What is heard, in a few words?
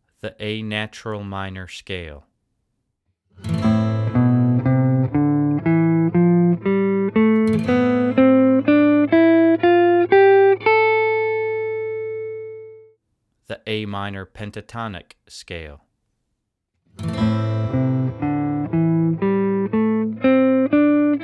Minor Scale Vs Minor Pentatonic